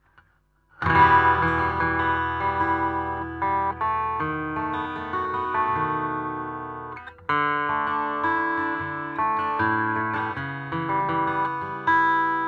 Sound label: furch s25